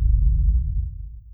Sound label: rumble; SuperPower 2 Redux V7A